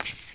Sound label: card take